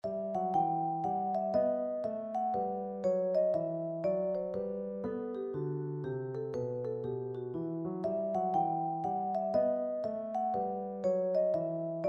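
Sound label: bach bouree, vibraphone; percussion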